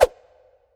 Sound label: STAIR, AIRSTICK WET; Q Up Arts; Denny Jaeger Private Collection